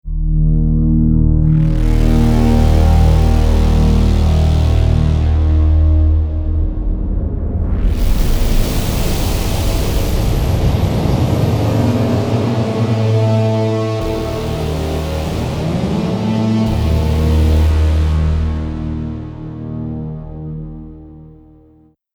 C15 Swarms, a group of voices in Unison mode using the variable detune parameter; Fuxi Swarms